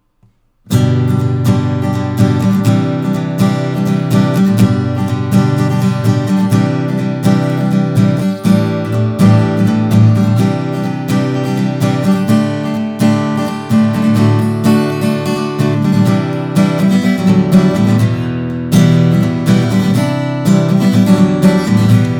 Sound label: str mic